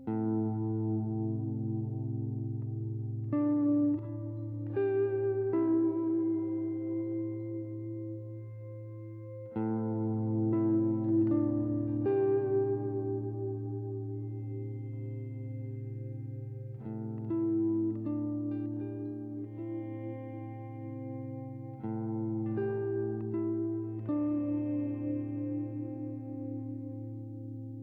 Guitar Background

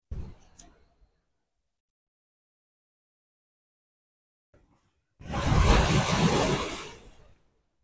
Ocean

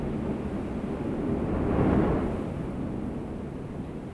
Wind audio

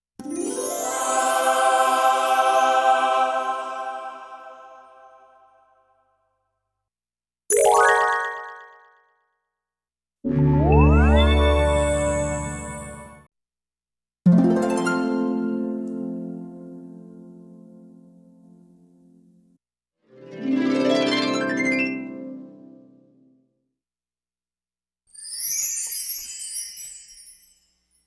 glissandos UP

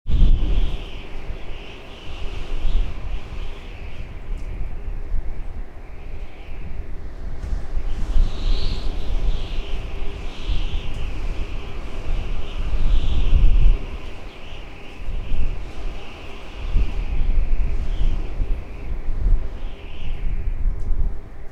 Wind In

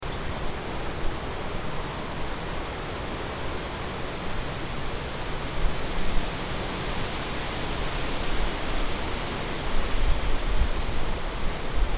wind noise

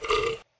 slurp

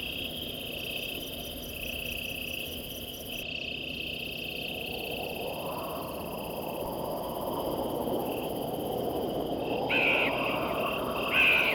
late night ambience

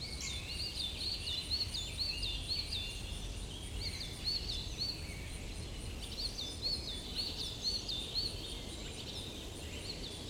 bird ambience

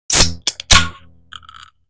Can opening

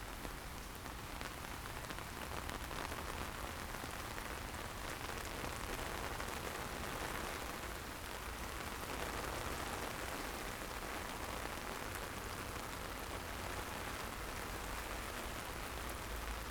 rain

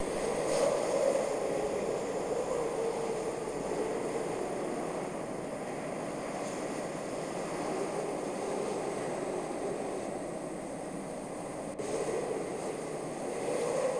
wind

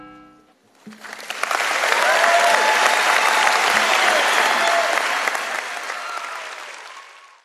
Applause

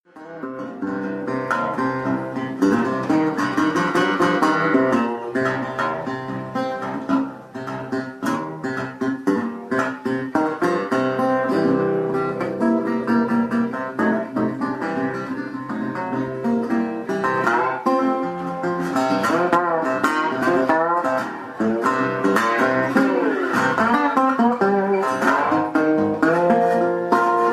Blues slide dobro guitar

